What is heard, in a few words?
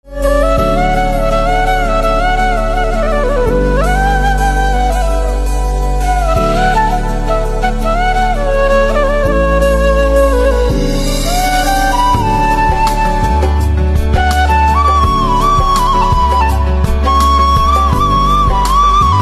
Flute Instrumental Ringtone